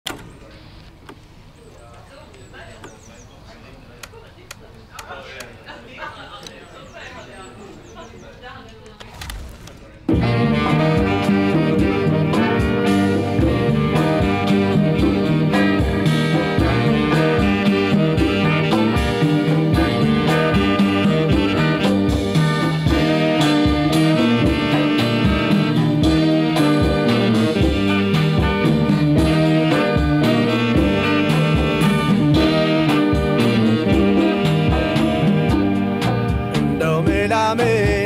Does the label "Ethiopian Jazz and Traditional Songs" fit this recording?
Yes